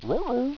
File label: ruhro, animal pain